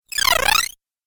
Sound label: Cool Fx Video Game; Cool Fx - Video Game 08; Video Game Sound Effects